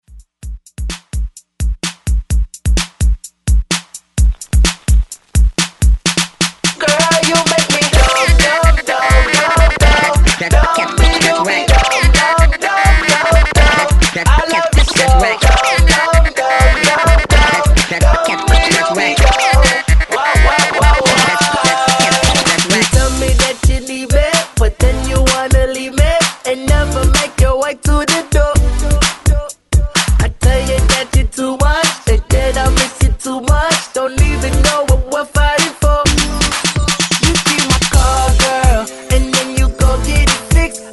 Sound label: Hype Scratch Re Drum 128 Bpm; DJDOLLS